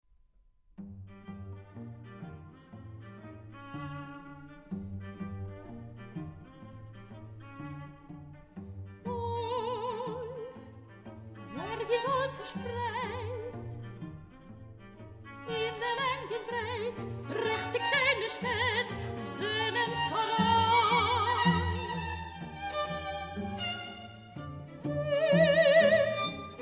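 Yiddish cabaret songs for voice and string quartet; desyatnikov; 5 chansons de cabaret yiddish pour voix et quatuor cordes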